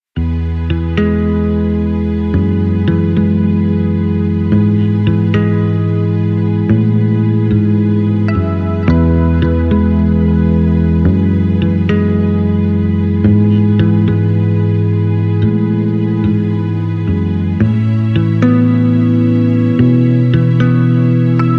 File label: bass